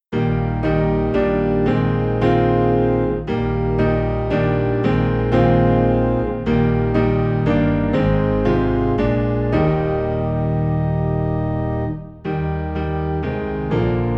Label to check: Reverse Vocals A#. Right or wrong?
Wrong